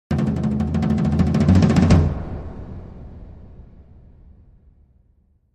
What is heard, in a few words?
Drums Tom Roller